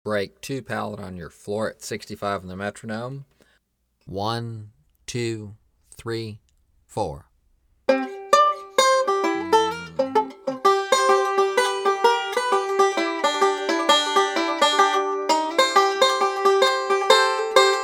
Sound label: Pallet On Your Floor Break 1, 65 Bpm